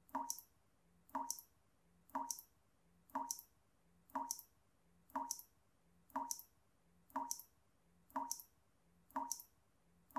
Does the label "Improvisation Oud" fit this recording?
No